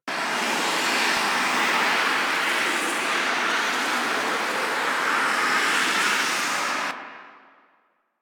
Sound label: Hover Loop; Warframe; Tengu